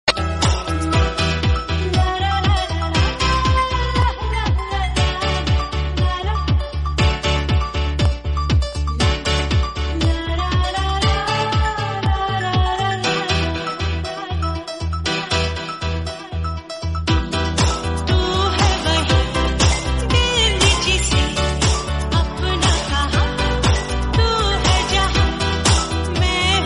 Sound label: Hindi Mix